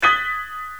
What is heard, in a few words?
piano